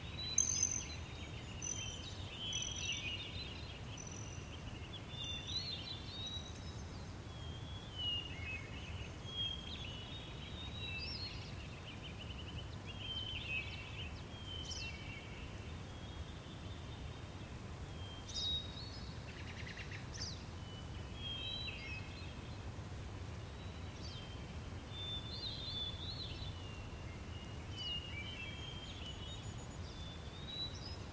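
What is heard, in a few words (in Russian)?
не громкое приятное сладостное пение птиц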